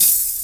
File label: loud open hat sound b key, Royality free open hat sound tuned to the B note.